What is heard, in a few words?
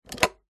household telephone landline receiver pick up